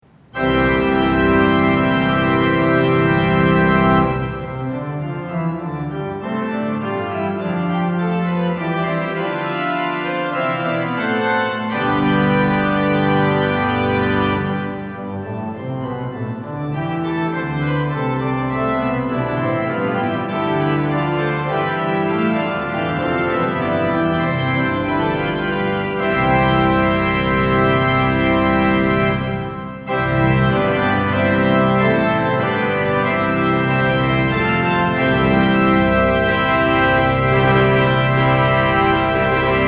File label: Finale Organ Symphony; Saint Saens